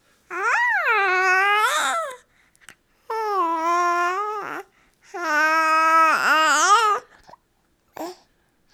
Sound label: aaaaaaaa; soundboard